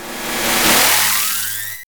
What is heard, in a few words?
SYN FX; X-Static Goldmine 1